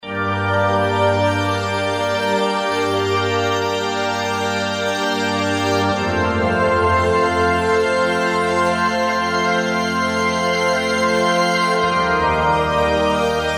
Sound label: korg m1r synth